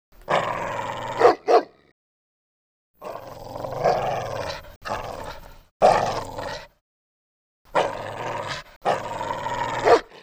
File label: barking